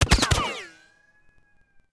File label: Machine Gun Dirt; Civ4 warlords